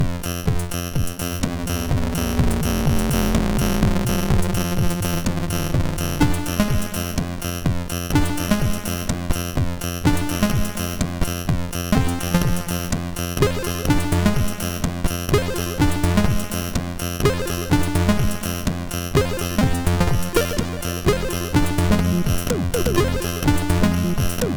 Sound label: Bus Noise main tune, MOS6581R4